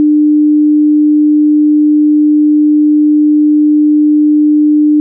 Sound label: Humm